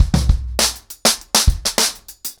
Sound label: 100BPM, ACOUSTIC DRUMS